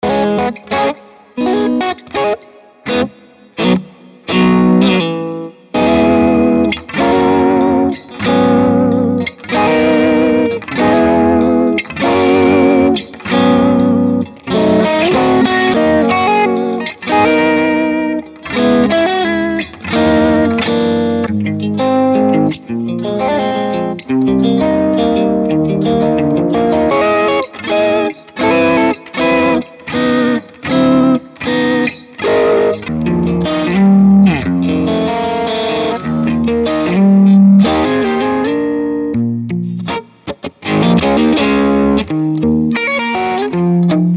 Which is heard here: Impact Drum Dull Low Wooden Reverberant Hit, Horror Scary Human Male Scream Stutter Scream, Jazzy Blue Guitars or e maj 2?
Jazzy Blue Guitars